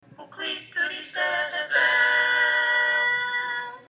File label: jingle